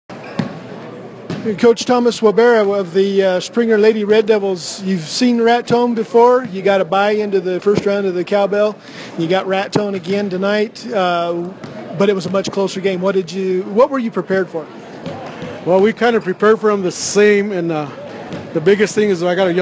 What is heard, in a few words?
Cowbell; Springer